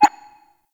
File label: Interior Orbital Station Console Rollover; Warframe; Tau